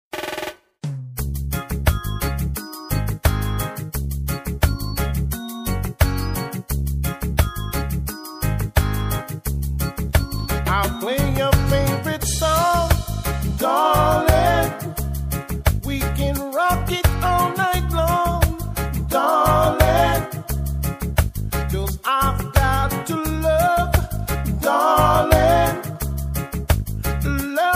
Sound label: mellow mood, steeldrumband